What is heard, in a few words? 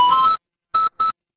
retro game coin